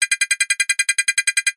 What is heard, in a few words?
multimedia game sound toggle